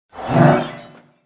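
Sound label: crystal golem attack voice middle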